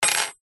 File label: foley metal peg small thin set down wood table